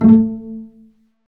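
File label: STRINGS, Cbs Pizz; Roland L-CD702; Cbs FX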